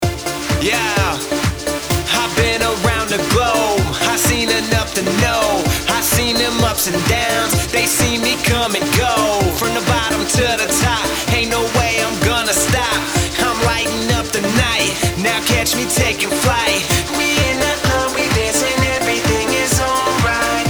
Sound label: cadence rhythm half time